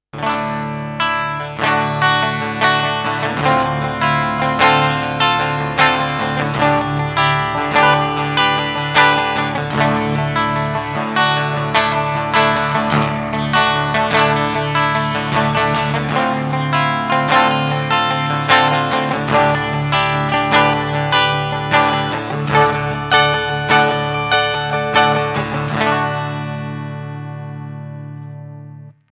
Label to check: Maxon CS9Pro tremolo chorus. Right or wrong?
Right